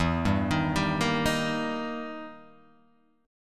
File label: E Minor Major 7th Flat 5th, E, down